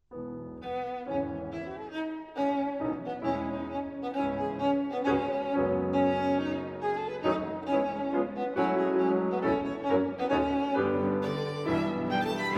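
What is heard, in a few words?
piano trio; ireland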